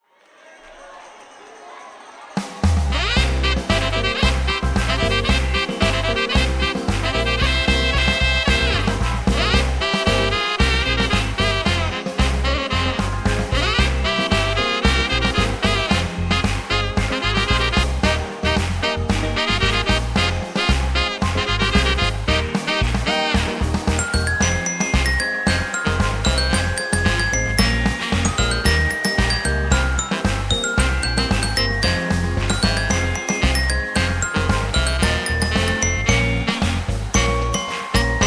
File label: glockenspiel; La mirra; Bottega D’Arte Cartapestai e Gigli di Antonio Mosca; Magliano